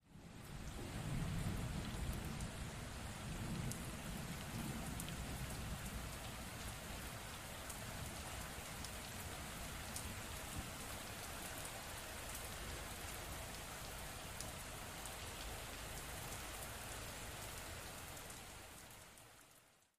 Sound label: thunderstorm; rain